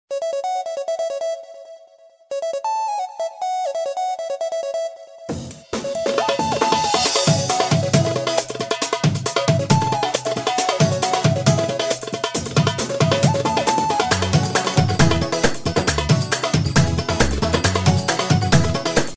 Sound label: tabla beat ringtone